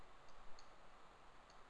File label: idle, brown bess; weapons